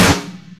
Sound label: Snare Oil Barrel Explosion